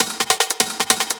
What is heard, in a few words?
Beat, Futurist Drum & Bass; Drums & Percussion